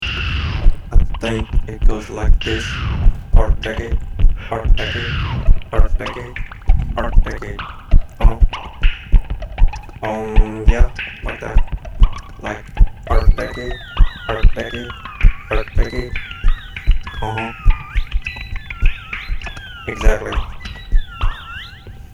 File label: chant